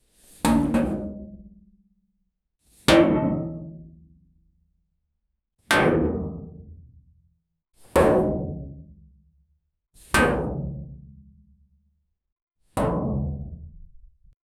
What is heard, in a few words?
landing on metal sheet